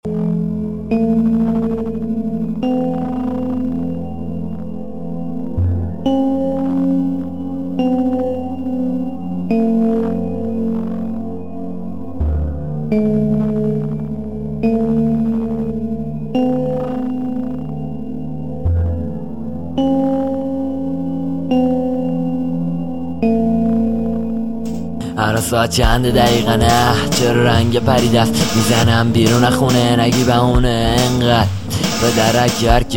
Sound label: Gong